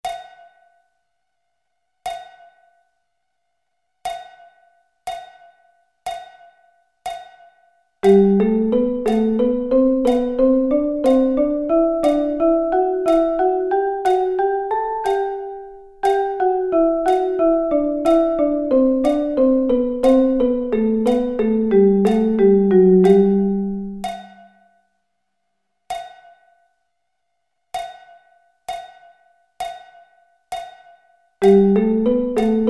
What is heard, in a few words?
G Double Sticking